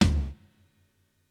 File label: Kit, breakcore Drum Hits